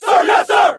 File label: Tank shoot